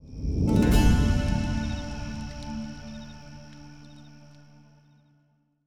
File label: Stingers sting campfire